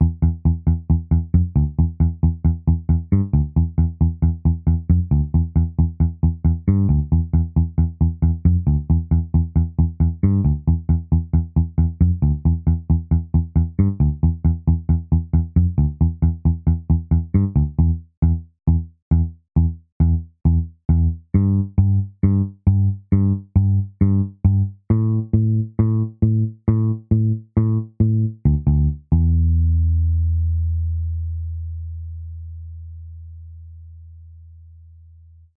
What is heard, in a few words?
theme song bass only